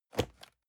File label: cardboard box medium place soft; objekte